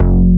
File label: BASS, POWER SYN; AKAI S6000 CD-ROM - Volume 1; SAMPLE WAVE MIXING 2